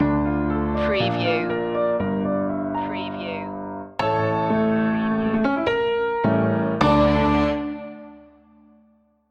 classical stinger; monti cardas

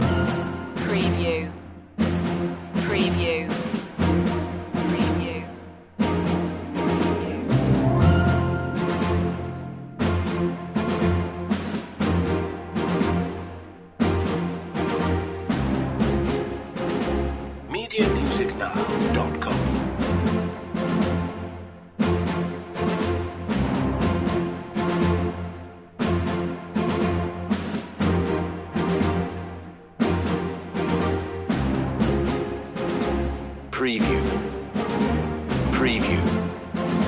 the long march home full underscore loop

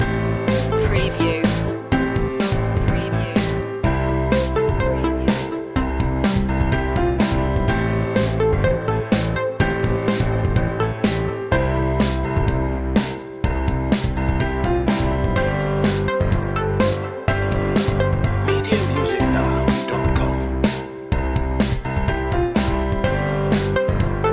summer drive loop

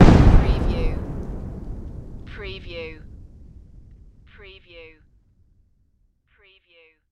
Explosion Dirt Debris; Sound Effects Bible

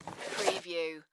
Object Pick Up Table; sound-effects-bible

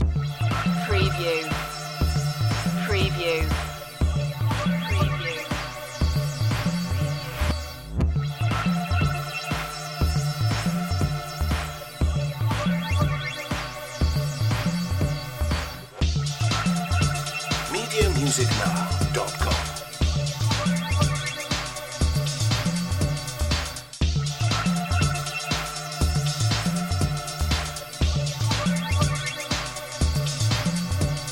electro stomp loop

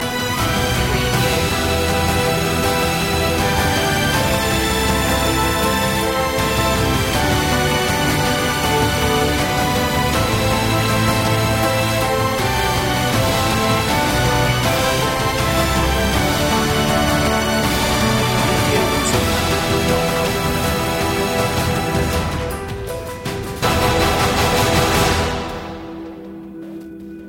no choir

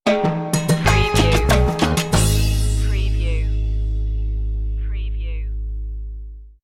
colombian party stinger

